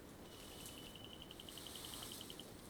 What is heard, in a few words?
Psychrophrynella glauca